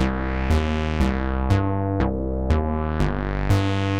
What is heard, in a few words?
120 Cm bass, bass loops, trance; Trance Explosion Vol1